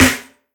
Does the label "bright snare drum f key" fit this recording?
Yes